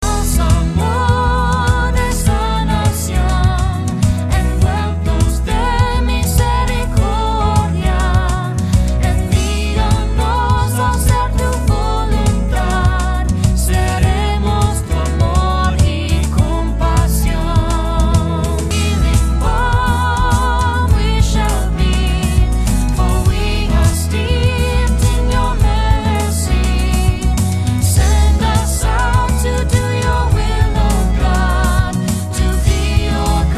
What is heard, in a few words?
Healing Balm refrain